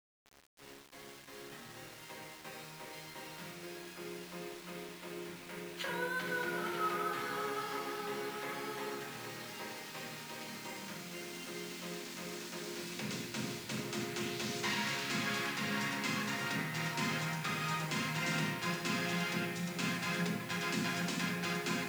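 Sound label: background music karaoke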